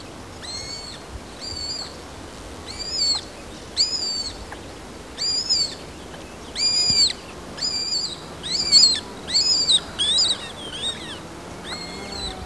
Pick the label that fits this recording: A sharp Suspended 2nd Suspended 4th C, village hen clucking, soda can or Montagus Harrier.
Montagus Harrier